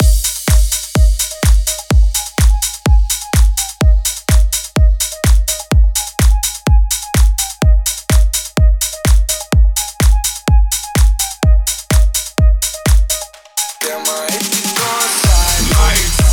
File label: House